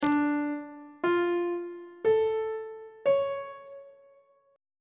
d minor major 7th chord intervals; on treble clef

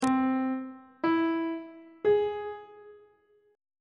c augmented triad chord intervals on treble clef

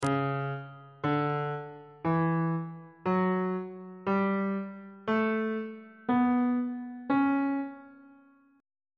d flat locrian mode on bass clef

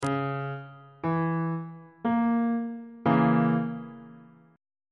b flat diminished 1st inversion on bass clef

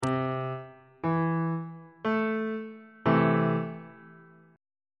a minor 1st inversion on bass clef

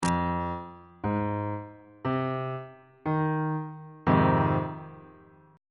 a flat major 6th 3rd inversion

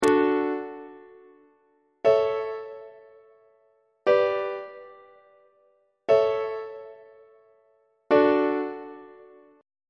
d major perfect authentic cadence on treble clef

